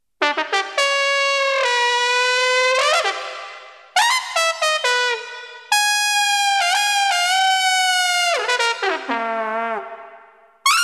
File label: yamaha ex